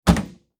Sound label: Auto Close Trunk